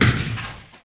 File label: impact; Far Gate